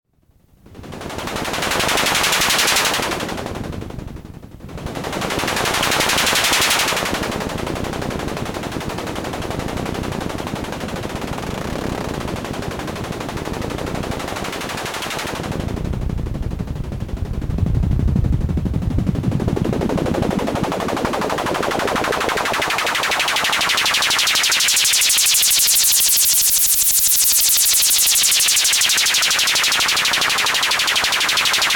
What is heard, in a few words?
EMS Logik